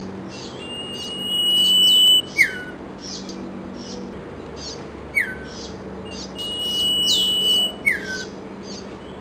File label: song classical; Amar